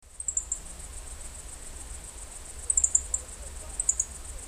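E cioides male worrying near nest, background Orthoptera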